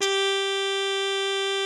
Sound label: MUTED G3, TRUMPETS; Greytsounds VOL-1